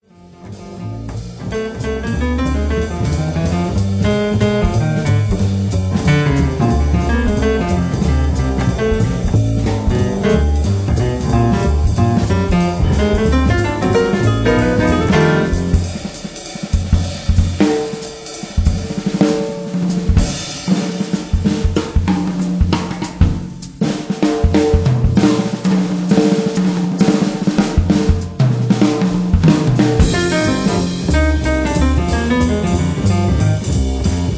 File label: Blues